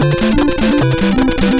Coin Heaven Hurry, BGM; Super Mario Bros. Remastered

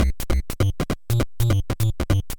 Jungle Pipe Cutscene; Super Mario Bros. Remastered

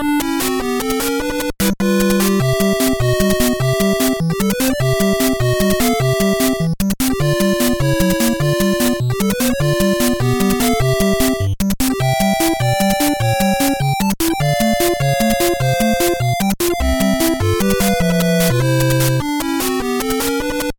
Underwater Hurry; Super Mario Bros. Remastered

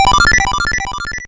Rank Up; Super Mario Bros. Remastered

